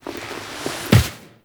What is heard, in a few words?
Stand Up Foley; Call Of The Tempestarii; Wraith Quest; Reaper On Bridge; Cinematics